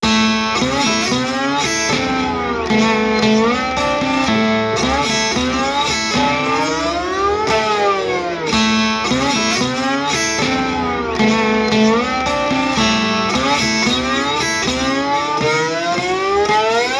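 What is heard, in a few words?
slide guitar; FMOD Example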